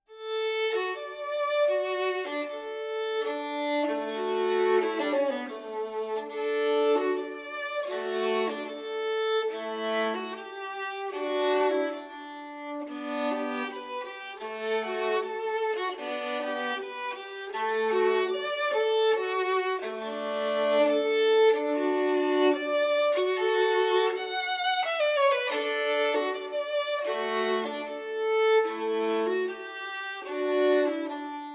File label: Solo Violin, chamber music